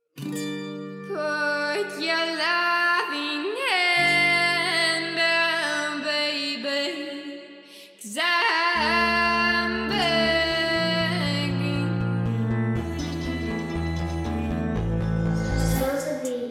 acoustic cover remix

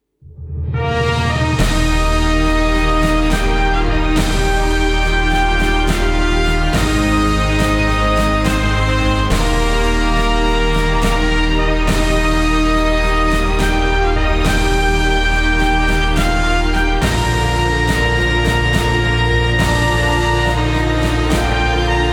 amazing grace trumpet

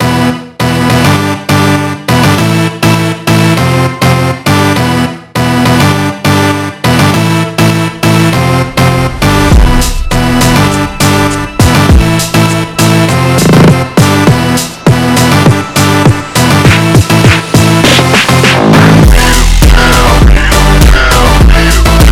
Slowed Reverb

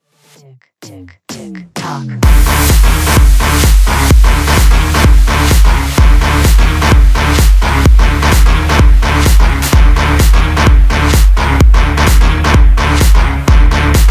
ringtone

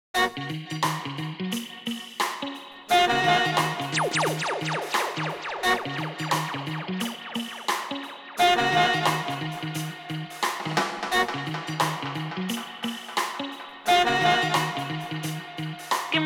heavy sound system mixed

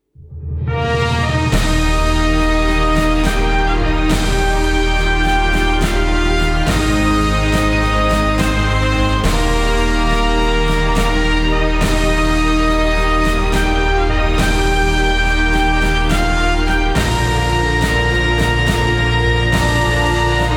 amazing grace orchestra instrumental

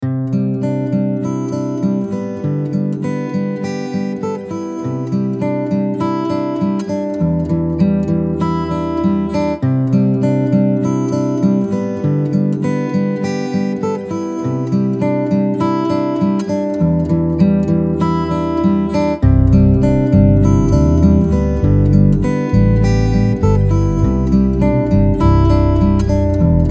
deep house summer

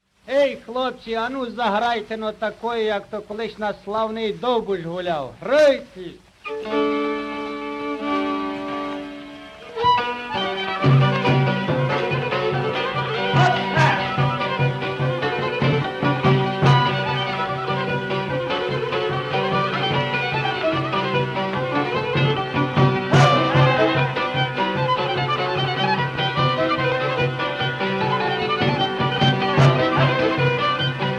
ukrainska selska orchestra

